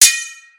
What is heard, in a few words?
sword clash